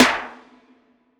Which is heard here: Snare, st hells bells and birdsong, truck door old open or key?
Snare